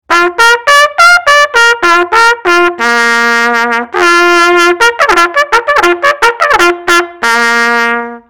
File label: Trumpet Song